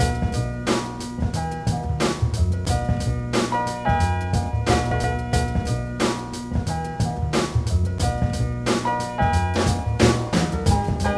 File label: beats